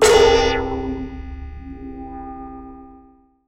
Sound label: Moog LPF & Delay, contact mic, Wire & Tin; musicradar; contact mic madness